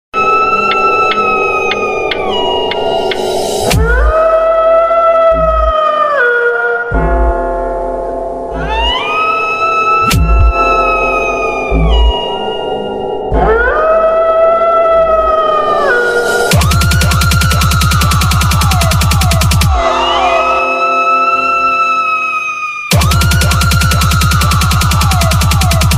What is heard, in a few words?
bhoot coming ringtone, hindi ringtone; bhoot live this place